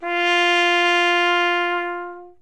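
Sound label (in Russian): труба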